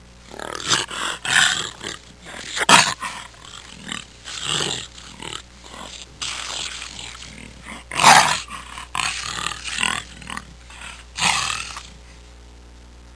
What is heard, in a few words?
jaguar